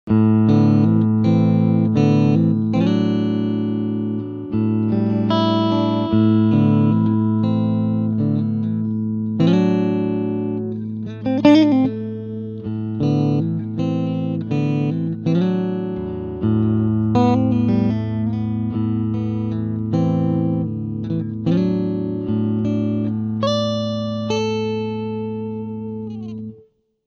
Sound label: dorian blues CLEAN